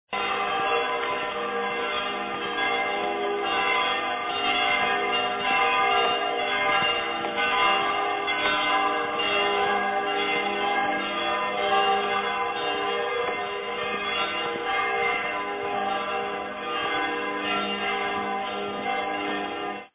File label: bells; Schwaebisch Hall; St. Michael's Church; germanytour